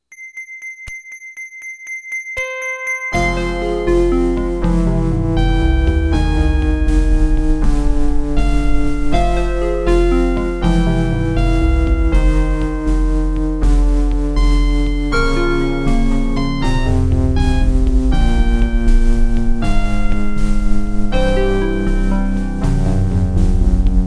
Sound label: midi opl3